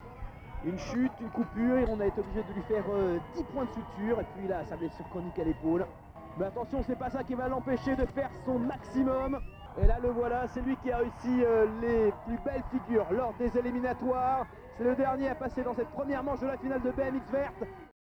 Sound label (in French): Punk Triso Trash intro